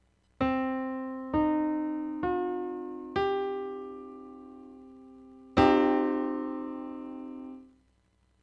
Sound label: Orwell 0 1 2 7 22edo